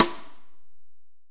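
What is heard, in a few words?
rim, ambience; AMB KIT#1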